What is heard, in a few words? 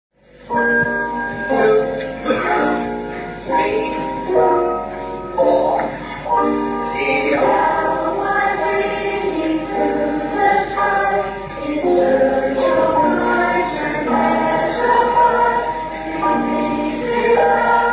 audience; britten